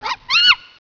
CHIMPAN; Canon; CameraWindow

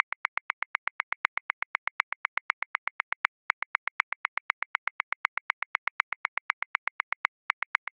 Rock Fatback Accent 16ths; Grooves; Ableton Live 12 Lite; Core Library

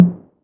tom hi, drums; Playdate SDK; MIDI Player

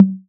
Tom Slam Dam; Drums

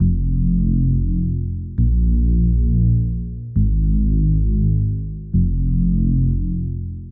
Light Fm 135 bpm, Synth; Loops; Tonal; Ableton Live 12 Lite; Core Library

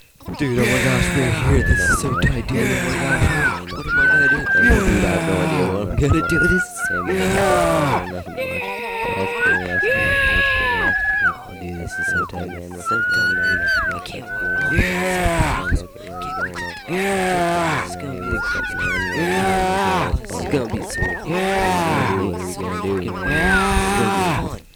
crowd

